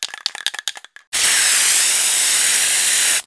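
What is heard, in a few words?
spray graffiti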